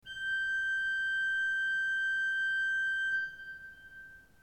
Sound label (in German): ton, orgel